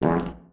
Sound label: fart; Apotheorasis